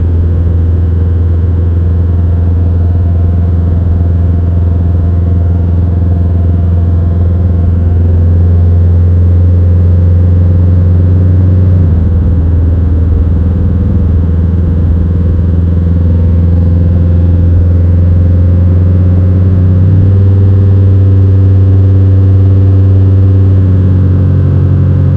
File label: make the car sound of, car engine